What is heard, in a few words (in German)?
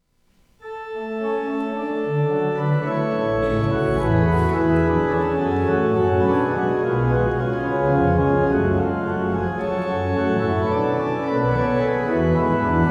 Orgelspiel